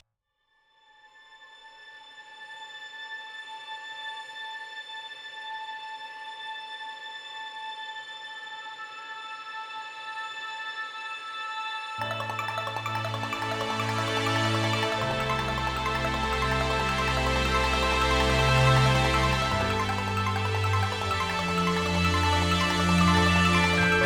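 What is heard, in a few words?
bit forest stinger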